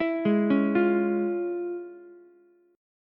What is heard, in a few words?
F Minor Major 7th Sharp 5th Ukulele